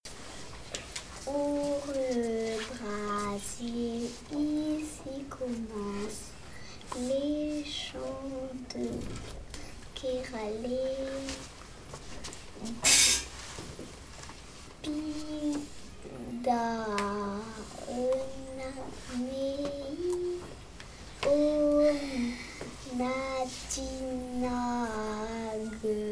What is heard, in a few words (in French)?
impro enfant